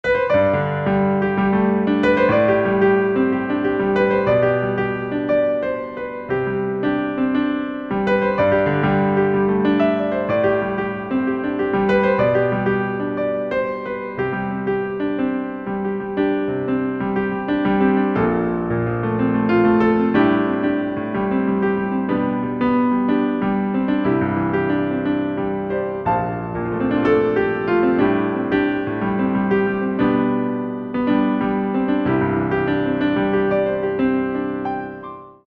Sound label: wedding song